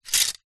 household clothes hangers plastic bunch several set down; zapsplat